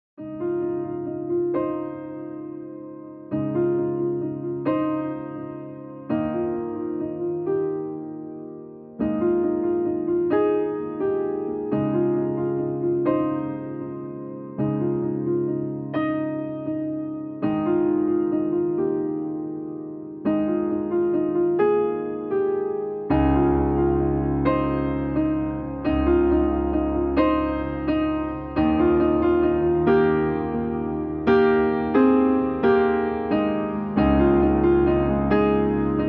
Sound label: Cinematic Piano